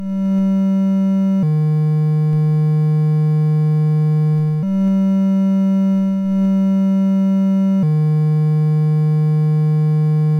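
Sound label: Magicians Ball, MOS 6581 SID chip music, games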